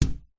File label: leisure hacky sack footbag hit glass window